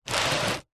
household book large old hardback slide on gritty hard surface; zapsplat